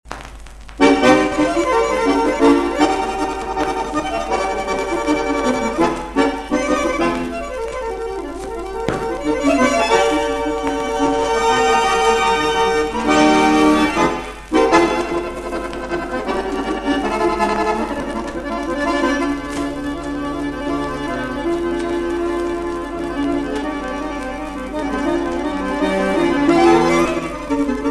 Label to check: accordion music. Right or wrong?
Right